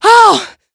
alyx hurt; svencoop addon; playersounds

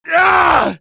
rebel pain; svencoop addon; playersounds

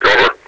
combine grenade; svencoop addon; playersounds

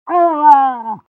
pain severe; tfc; svencoop

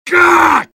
sniper pain severe; svencoop addon; tfc; player

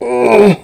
launch pain; eli; svencoop addon; playersounds

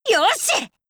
weap get; svencoop addon; playersounds; Tenno

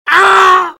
spy pain severe; svencoop addon; tfc; player

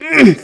launch pain; eli; svencoop addon; playersounds

